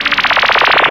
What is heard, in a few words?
PERC FX